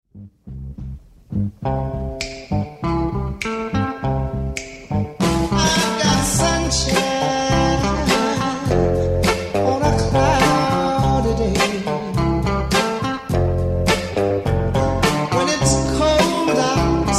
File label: riff